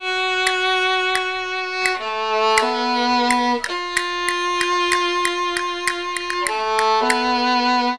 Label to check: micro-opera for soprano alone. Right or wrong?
Wrong